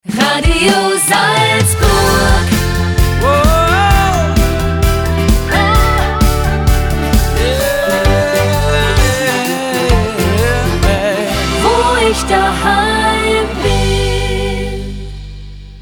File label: transition; Radio Salzburg